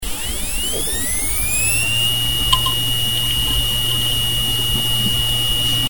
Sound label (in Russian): Zvuki izdavaemye polnostyu ispravnymi vinchesteromi tonkih modeley (DiamondMax Plus8)